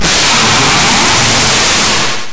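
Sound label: amb short hiss; cruisecontrol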